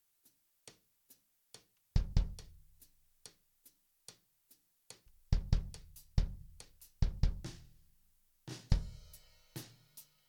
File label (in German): Schlagzeugsolo; Tapdipidap